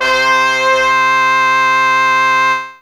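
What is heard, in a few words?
Brass Section C4, Fairlight CMI IIe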